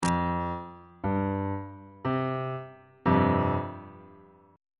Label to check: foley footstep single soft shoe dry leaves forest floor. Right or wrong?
Wrong